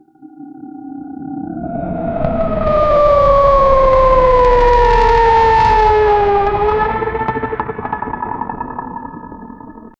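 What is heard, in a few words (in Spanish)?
rotor eléctrico aumentando; increasing intensity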